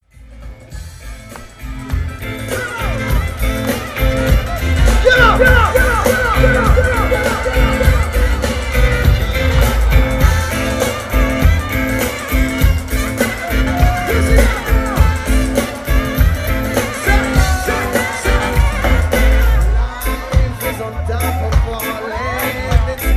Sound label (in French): extrait concert